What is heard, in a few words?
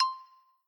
Video Record, ui